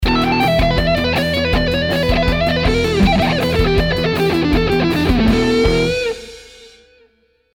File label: Hard Rock Speed Lick; hard rock speed lick in the style of many hard rock/m; guitar